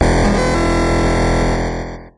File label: deep drop